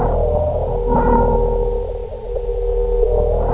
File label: alien cycle tone; de subsanctum